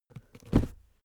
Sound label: cardboard box small put down; objekte